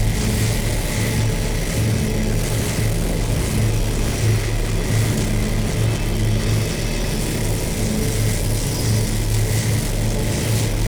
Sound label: Medusa Puzzle Shrine Dome Loop; Duviri